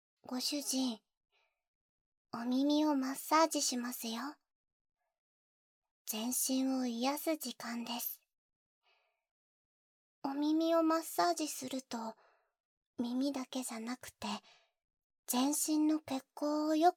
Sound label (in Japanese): 癒しの耳マッサージ, 環境音なし, ASMR